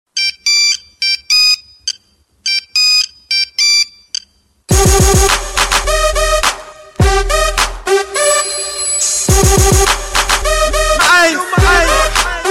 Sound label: Nokia Tone